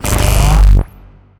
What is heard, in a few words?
vaccinator charge tier; tf2